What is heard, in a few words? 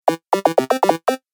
Retro Gaming Sound; Video Game Sound Effects